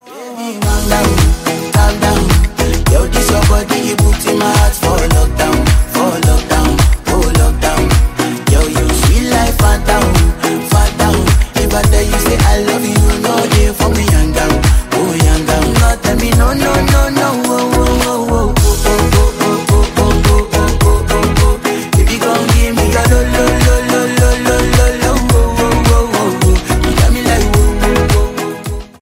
remix ringtone

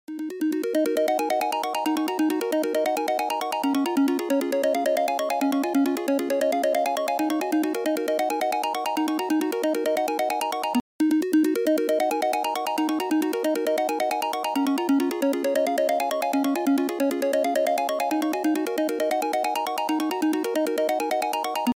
apple iphone ringtone

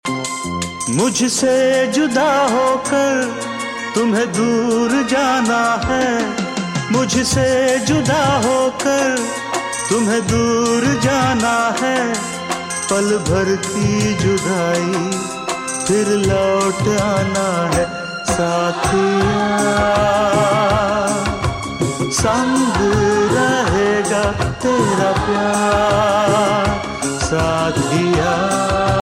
male; hum aapke hain koun

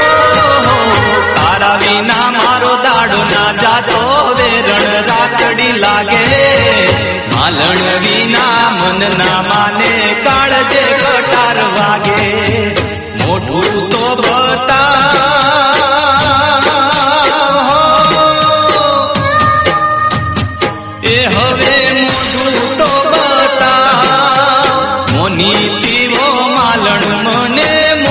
love gujarati

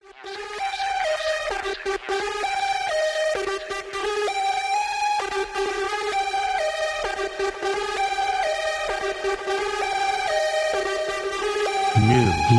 mix electronic ringtone

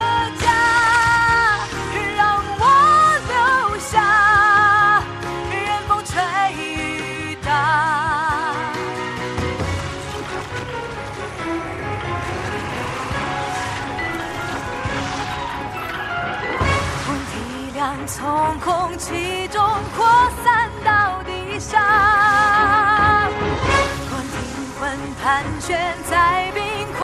chinese ringtone

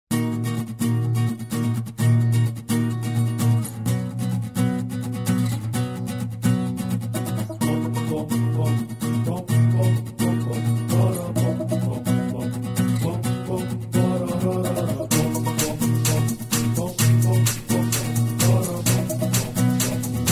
guitar

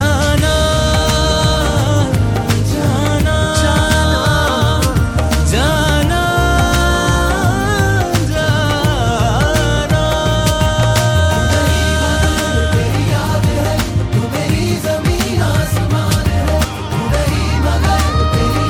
movie song ringtone

